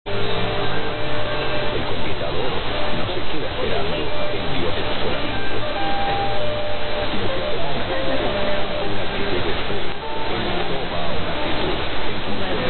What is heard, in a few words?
Radio; 1130 Radio Ideal Venezuela